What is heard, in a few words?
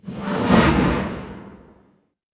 magic scifi teleport